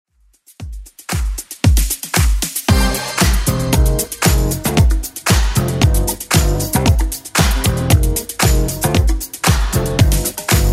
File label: 80s Club 115 bpm CLEAN